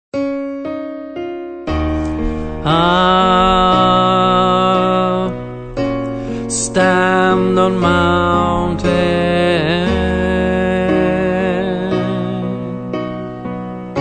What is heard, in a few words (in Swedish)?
bas